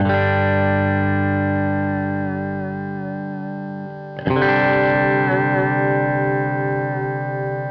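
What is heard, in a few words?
Country Guitar, Intro Alt; Digidesign; Pro Tools Essential Loops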